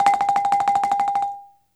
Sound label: Balafon; E-MU Producer Series Vol. 7 – Old World Instruments